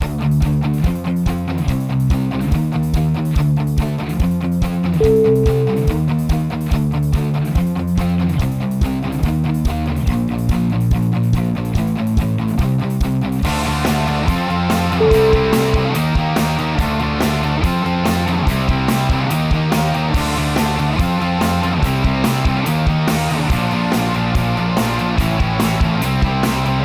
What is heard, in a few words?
punk